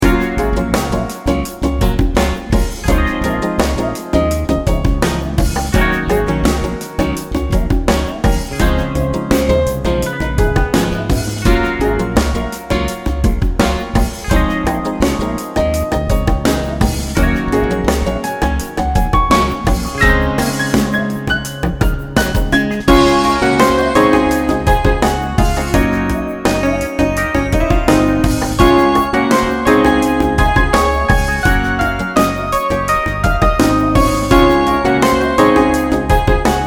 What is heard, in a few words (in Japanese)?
おしゃれ jazz